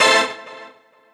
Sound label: synth rave C; jumbo